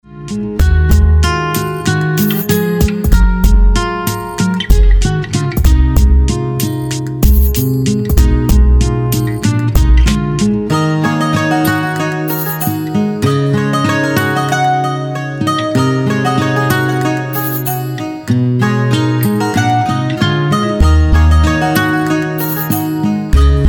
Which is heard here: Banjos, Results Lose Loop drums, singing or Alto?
Banjos